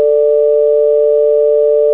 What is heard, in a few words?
Beep Bell